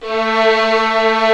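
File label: strings, 8pc; String Master Series; Vlns 2-5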